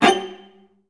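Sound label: clarinet; Best Service; Peter Siedlaczek's Advanced Orchestra; CL FX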